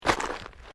Footsteps Boots Volcanic Rock Step; Footstep Foley; Footsteps (Sound Effects:Human)

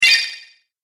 Classic Spell, magic sound effects

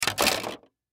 Toy Ship Set Down Cement Plastic; Toy Planes (Sound Effects:Toys); Blastwave; Foley

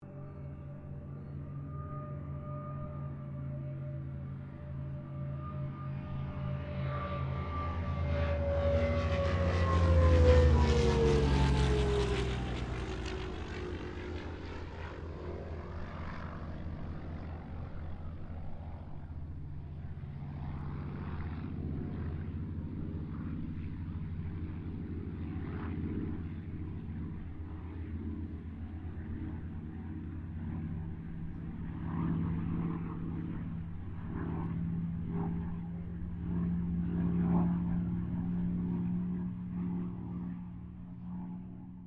Kittyhawk Boomerang pass, Airplane; Mixed aircraft squadrons; Airplanes (Sound Effects:Vehicles)

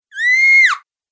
Female Scream Helpless; Screaming Sound Effects

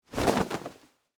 Dress Pants Shake, Foley, Clothes; Dressing; Human; BlastWave

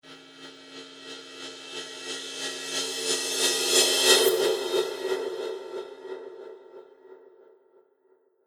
Reverse Cymbal Tremolo; Cymbal Sound Effects

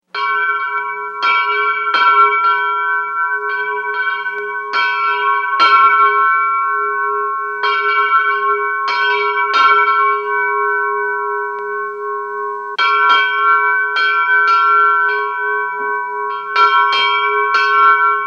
School Handbell Vintage; Bells; Musical

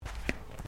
FOOTSTEPS THONGS (FLIPFLOPS) CARPET STEP; Footstep Foley; Sound Effects:Human

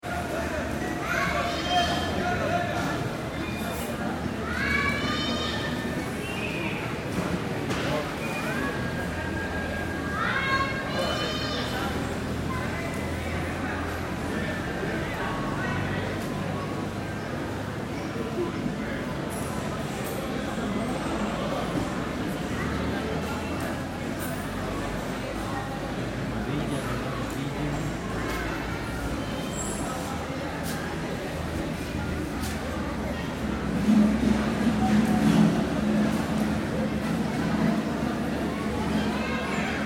Crowd Market Costa Rica Kid Call Outs; Stores And Mall Sound Effects; Backgrounds; Big Room Sound

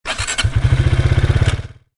Motorcycle Dirt Bike Start Up; Motorcycle, Motorbike start engine; Motor turning over; Ignition and motor starts

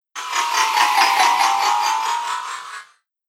Space Zoom, whoosh; sound design

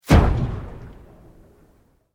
Sweep Organic Fast

